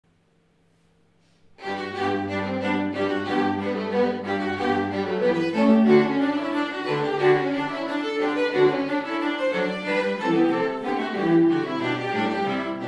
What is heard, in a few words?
Classical; Allegro, from Brandenburg Concerto no 3 - Bach